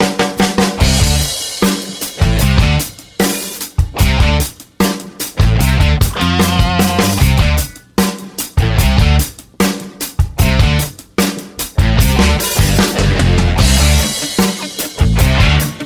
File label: BLUESY ROCK